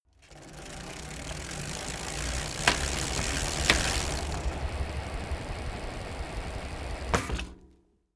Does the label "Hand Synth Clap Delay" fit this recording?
No